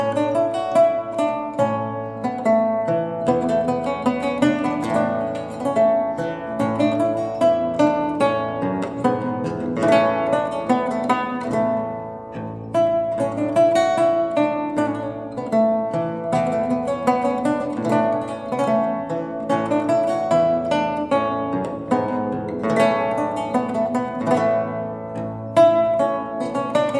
Gavotte a cordes avalees; Antoine Francisque; Orphee

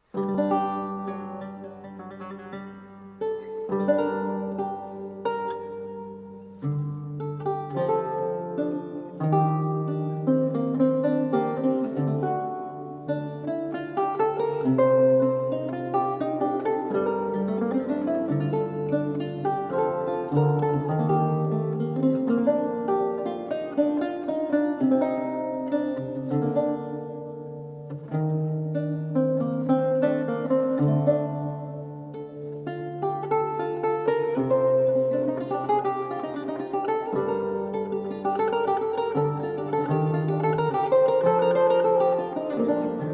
Galliard - Praeludium, Lute music; John Dowland

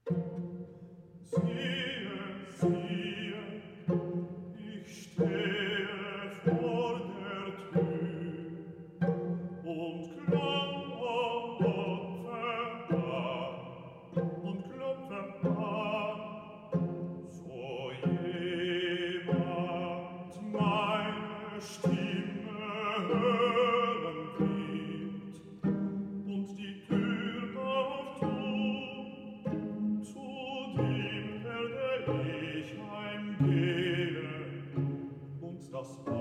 Recitative; Nun komm der Heiden Heiland BWV 61; Siehe ich stehe vor der Tur; Bach; Cantatas

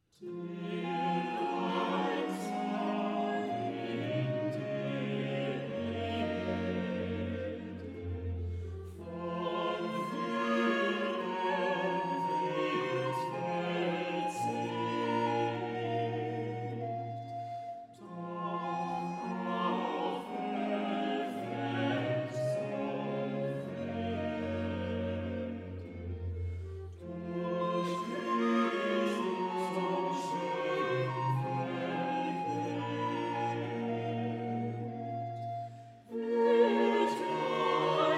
Chorale; Komm du susse Todesstunde BWV 161; Der Leib zwar in der Erden; Bach

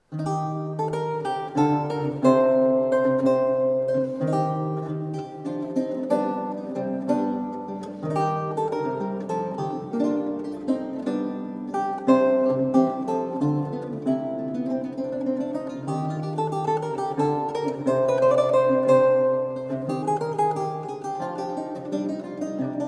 Galliard, Lute music; Lady Clifton Spirit; John Dowland; Lute songs

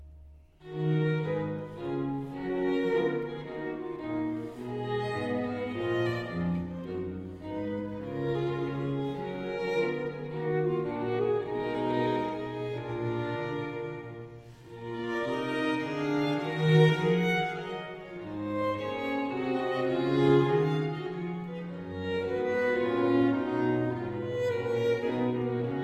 Cantata Minuet; Il Delirio Amoroso; Handel

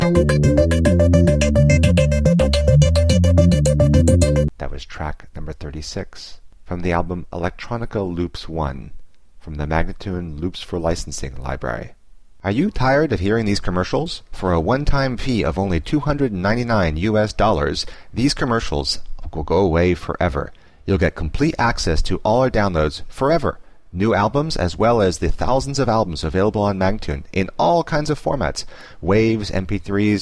107 D spoken; Electronica Loops 1

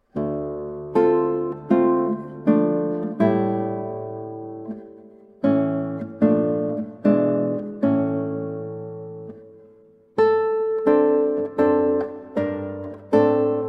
Prelude in D Minor; Preludes and Fugues

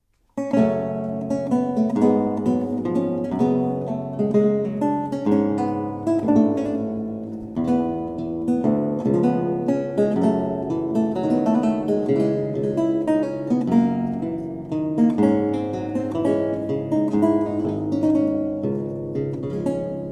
Courante Sol Mineur, Luth Baroque; Robert de Visee

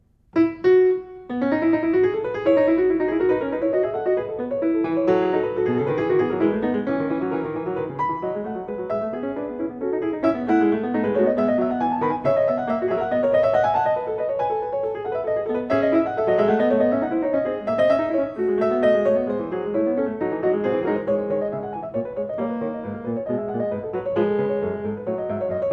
Prelude Fugue No. 9 In E Major BWV 854 Fuga; Bach; Il clavicembalo ben temperato

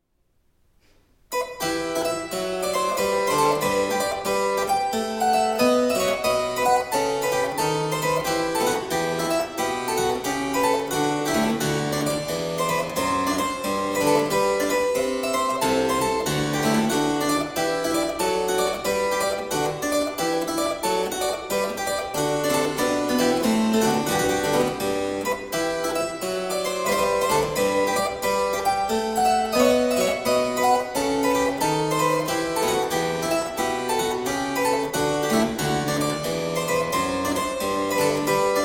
Gigue from Suite in F minor; BWV 823; Johann Sebastian Bach

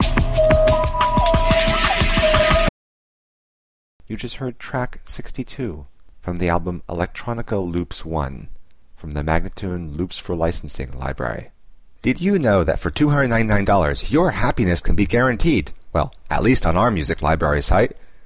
090 E spoken; Electronica Loops 1

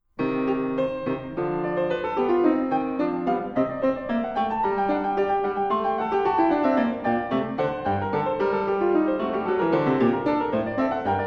Partita in C minor (Capriccio); Johann Sebastian Bach

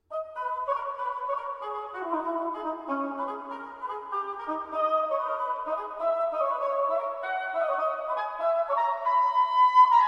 Suite in A minor Gigue; after BWV 1011; JS Bach

